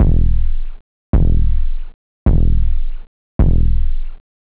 acid, drums techno; OctaMED SoundStudio V1

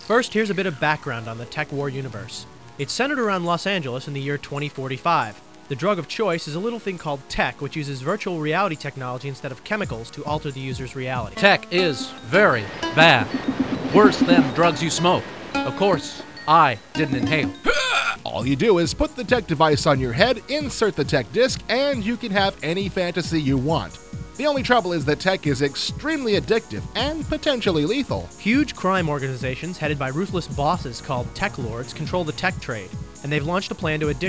tekk

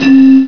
Bottle; If Monks had Macs; MacCube Volume 5: Home Applications

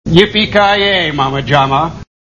yippy

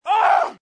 die; Casanova

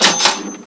case cash; Spider-man Cartoon Maker; brushes

